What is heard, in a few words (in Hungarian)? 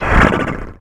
fujtatas; The Wild Thornberrys Movie